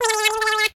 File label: licking